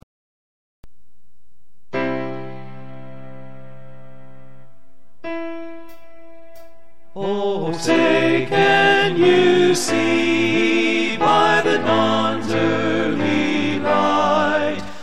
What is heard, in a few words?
Star Spangled Banner Tenor; learning tracks